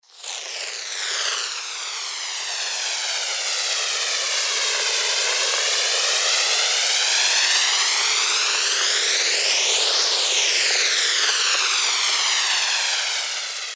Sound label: NoiseFX Loop 140 BPM; Nitro; revealed fx loops vol 2